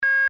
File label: Digital Signal Subtle Phoner Beep Long; Digital Clicks, Blips & Alerts; Digital Sound Effects